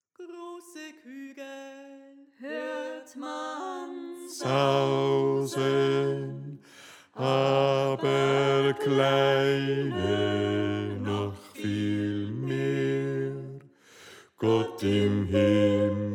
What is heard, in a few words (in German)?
Bass